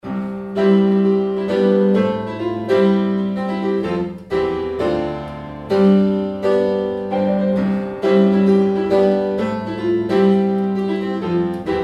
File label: Choir; EdenChoir